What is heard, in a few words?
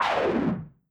beast roar more savage